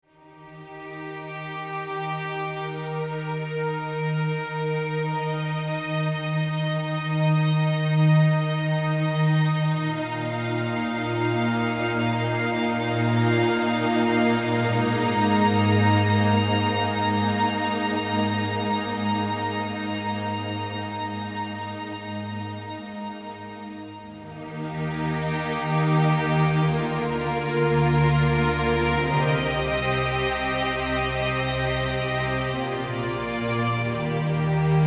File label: ambiance